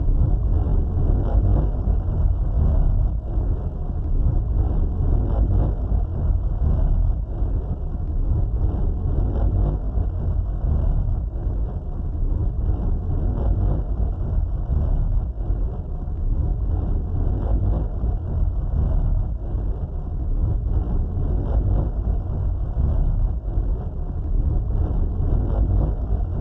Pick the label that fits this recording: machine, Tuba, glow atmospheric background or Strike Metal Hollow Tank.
glow atmospheric background